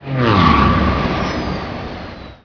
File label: pod wizby